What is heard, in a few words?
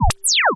laser; lasers